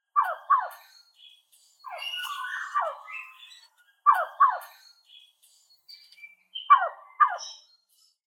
Himalaya-Glanzfasan Lophophorus impejanus; Vogelstimmen

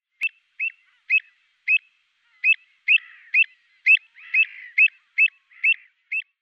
Saebelschnaebler Recurvirostra avosetta, Vogelstimmen

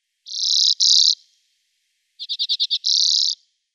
Birkenzeisig Carduelis flammea; Vogelstimmen

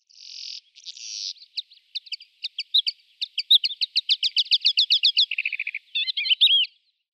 Kalanderlerche Melanocorypha calandra; Vogelstimmen

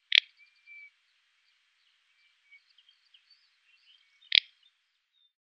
Zwergtrappe Tetrax tetrax; Vogelstimmen

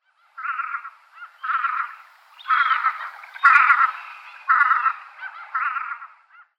Samtente Melanitta fusca; Vogelstimmen

